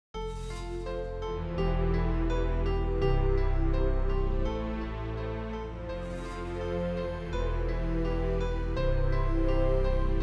Cinematic Uplifting Atmospheric; amperAI

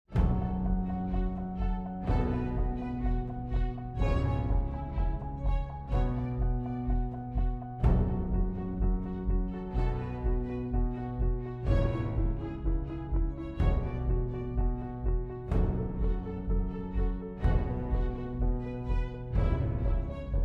Cinematic Dark Dramatic; amperAI

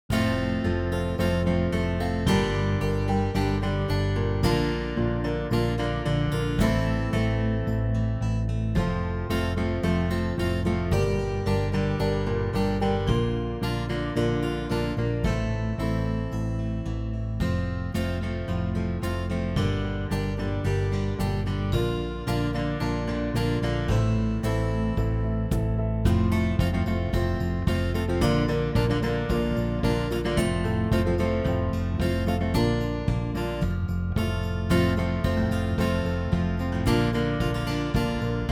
Modern Folk Playful; Auto Compose; amperAI

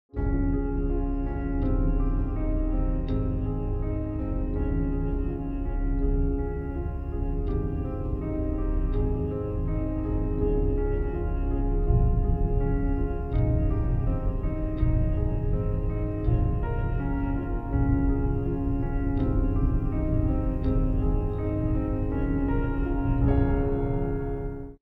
Cinematic Uplifting Atmospheric; amperAI

